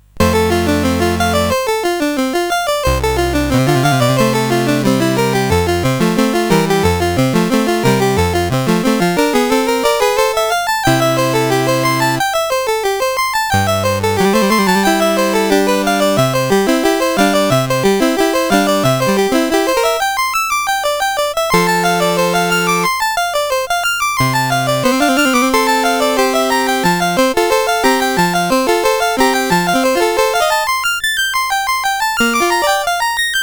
Prelude in F Major, CSG8580R5; Op28 No23

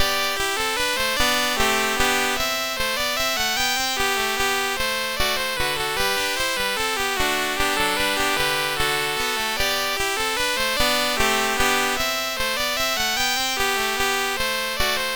Minuet in G, CSG8580R5

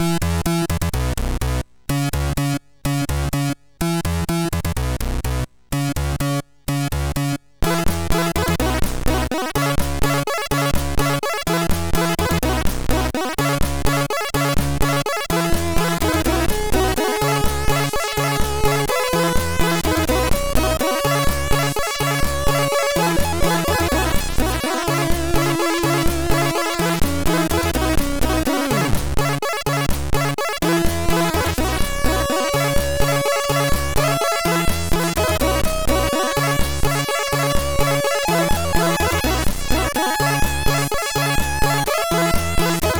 Soul Ballad, MOS6581R3

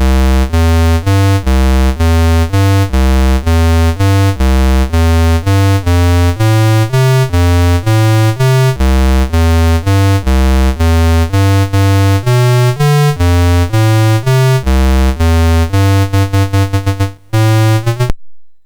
Drom Beet, MOS6581R2

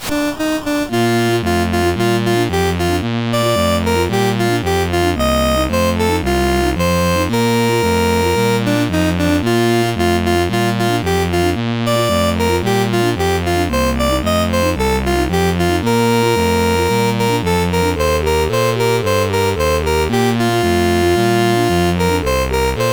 CSG8580; CSG8580R5

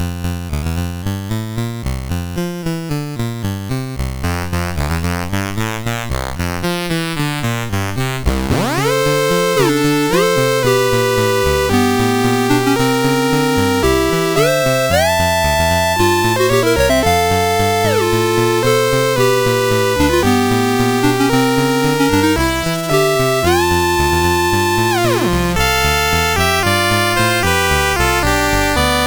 Siren Walk; CSG8580R5

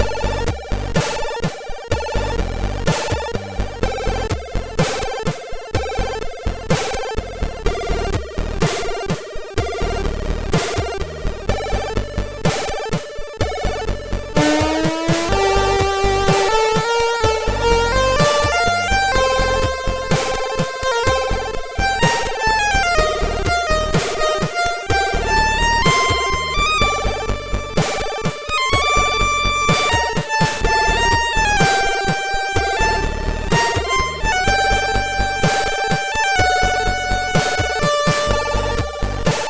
Short n Sad sid MOS6581R4

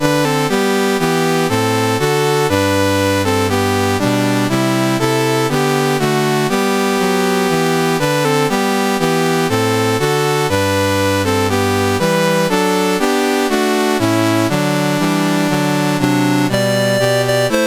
Barbados, National Anthems, CSG8580R5

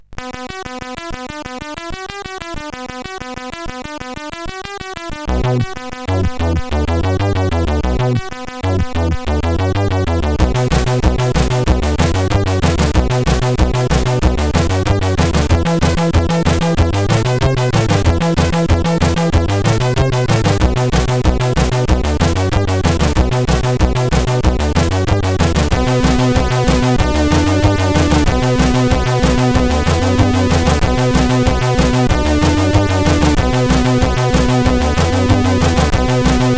Pop Shuffle, SID CSG8580R5

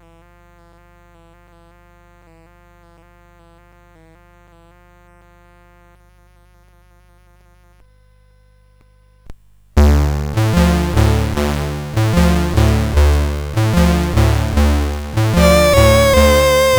Habanera, CSG8580R5

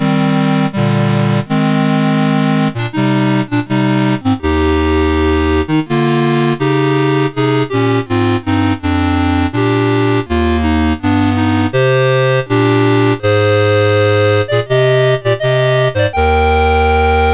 Farandole, CSG8580R5

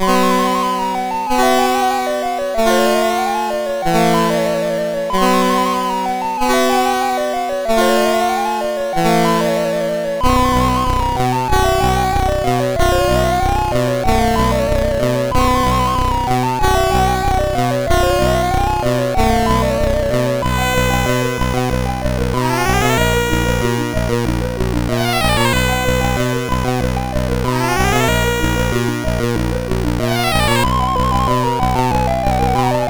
sid MOS6581R4

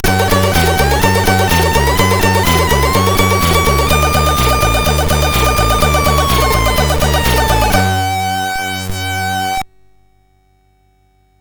Game Music, sid CSG8580R5

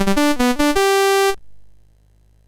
sid MOS6581R2; California Games

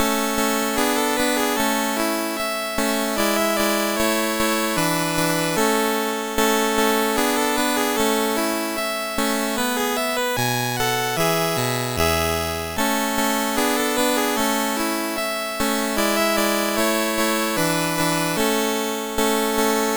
Anglaise, MOS6581R4

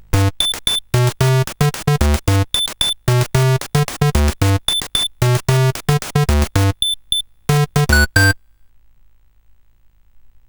sid, CSG8580R5; Coco-Notes

